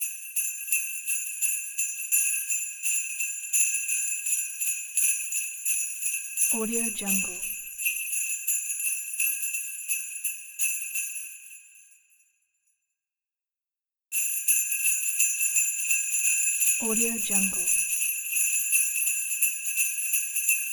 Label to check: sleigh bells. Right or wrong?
Right